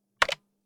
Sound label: Phone Button